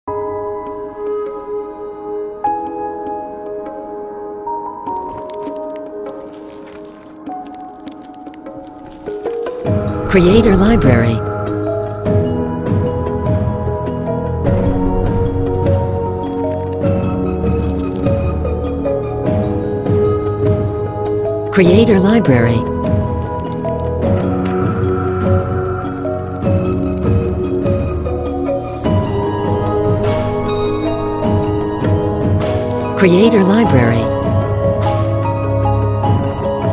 Mystical Dream, cinematic music

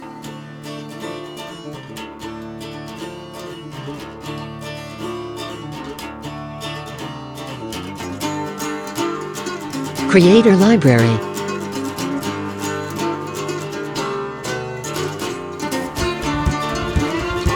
Irish Bar, Folk Acoustic

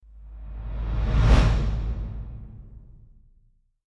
Swoosh

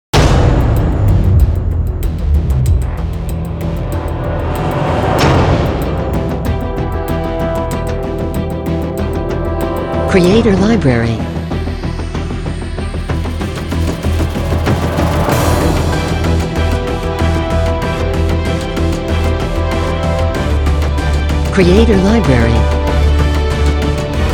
Epic Blockbuster

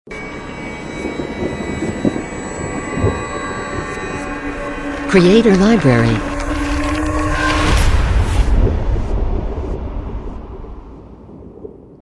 Horror Logo; Logo-Idents

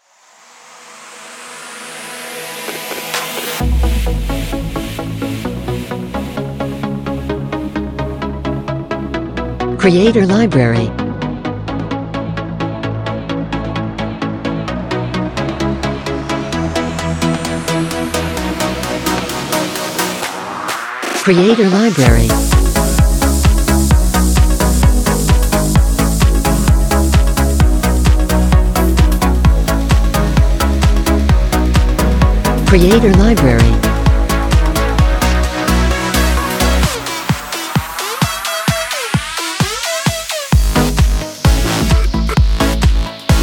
Instrumental, Electronica music

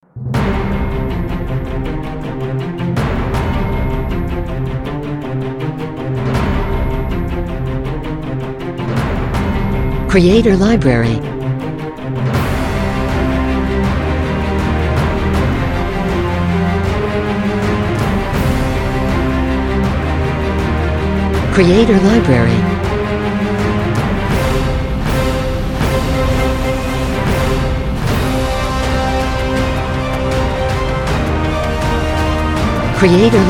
Blockbuster Trailer

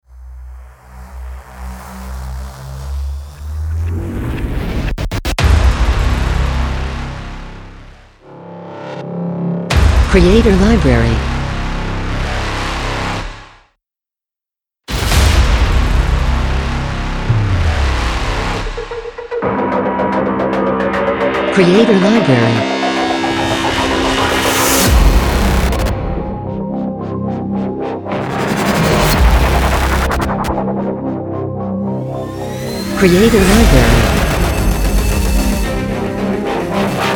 Glitchy Trailer, cinematic music

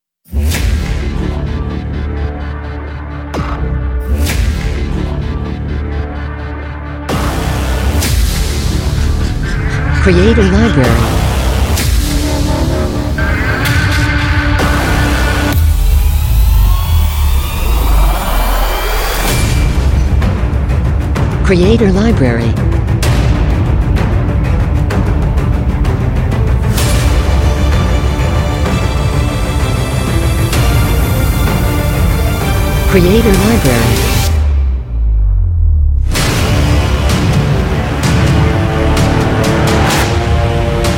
Hollywood Trailer, cinematic music

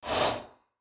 Short Swoosh

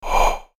Short Swoosh

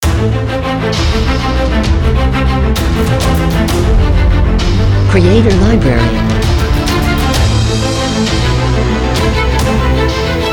Epic Power Logo; Logo-Idents

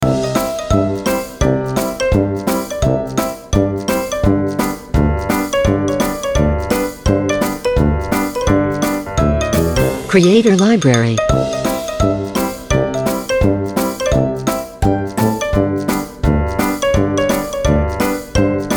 Zippy, Children

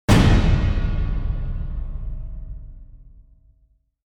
Trailer Hit

